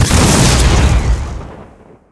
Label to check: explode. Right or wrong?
Right